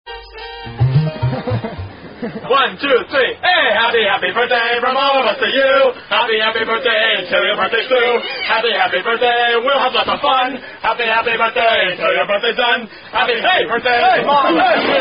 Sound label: happy happy birthday